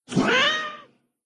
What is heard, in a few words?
cat